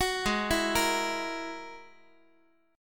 G sharp 7th Suspended 2nd Sharp 5th, Guitar; G#7sus2#5; arpeggiated; x,x,9,6,8,9; JGuitar

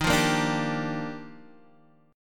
G Minor 7th, Eb; Gm7/Eb strummed; JGuitar

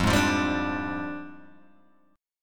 F Minor 6th Add 9th, Guitar, Open G; 3,1,3,0,3,0; down; chord shape

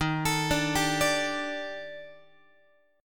D sharp Major 7th Flat 5th D sharp Guitar, chord; D#M7b5; arpeggiated

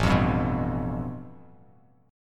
A 7th Suspended 2nd Sharp 5th, Bass Standard

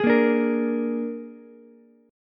Ab Suspended 2nd Flat 5th Ab down Ukulele; strummed; JGuitar

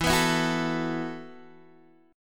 E Suspended 2nd Suspended 4th, Guitar, Open G; down

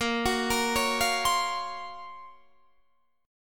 F sharp Major Sharp 11th A sharp

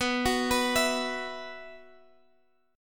B Suspended 4th, Guitar, Half Step Down

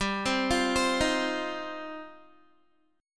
G 7th Suspended 4th Sharp 5th, Guitar; Listen to G7sus4#5 arpeggiated; G7sus4#5 Chord

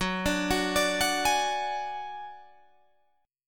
Gb Suspended 2nd Sharp 5th Guitar Drop D

